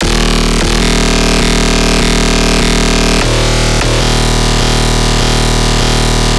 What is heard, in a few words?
Bass Shot D# 150, Dubstep; Dubstep Basses; Loops; FatBoy Full; GhostHack; Advent Calendar 2021